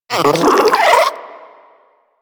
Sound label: vocal captured idle, critters; Warframe; Hunting Critters; Nexifera